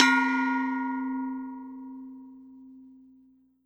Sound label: Korg N1R Gamelan C4